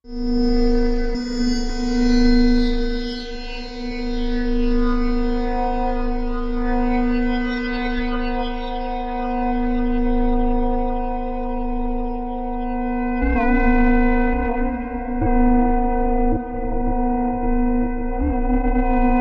Stress Creator Machin, sci-fi ambience

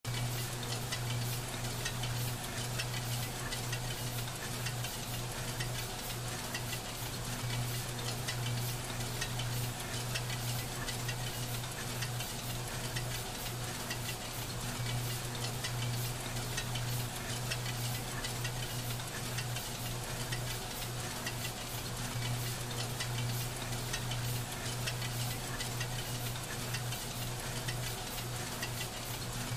Ventilation Noise Bu

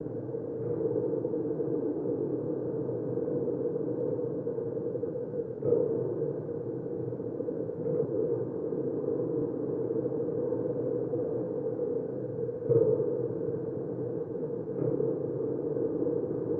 Ambience Machine St; industrial ambience

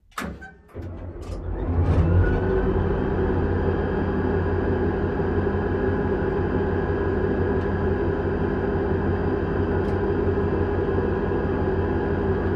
M60 Tank Int Startup

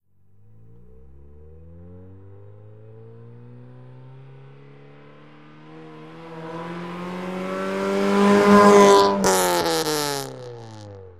Integra Appr Fst Accel, acura integra ext.

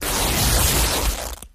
Cloth Rip Deep Slow

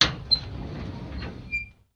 Drawer Metal Open Qu; drawers

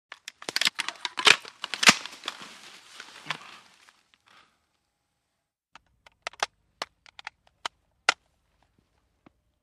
M16 Rifle Load Clip In

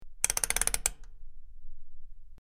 Rotating Switch Clic

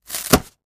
Styrofoam Sheet Crac, plastic

